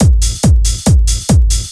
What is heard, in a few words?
Beat, Techno Drums; TechnoMusicMaker